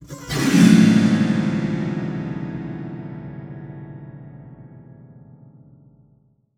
GLISS; Xsample VOL-7

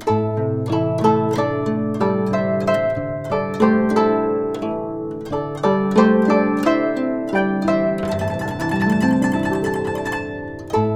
GUCHIN 90 C; INIS - Opium

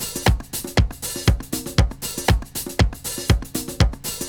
BRASIL UP

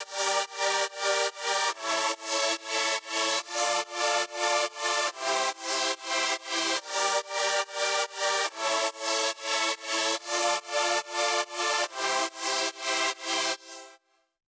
PWM Kit 142BPM Chorus - Subpad DRY, Gmin; Vengeance - Essential Clubsounds Vol.5; Melody & Synth

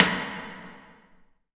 IMP SNR DRUM, Drum, Cinematic Impact

